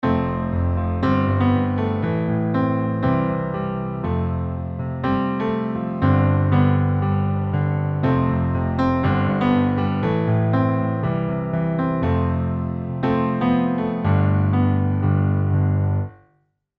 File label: EZkeys 2 Acoustic Pop